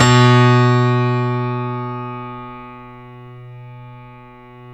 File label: StereoGrand, Keyboards